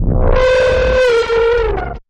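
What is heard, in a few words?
Blast Squelcher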